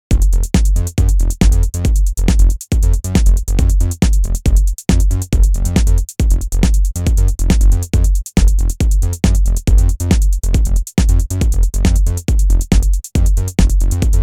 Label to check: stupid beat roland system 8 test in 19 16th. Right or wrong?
Right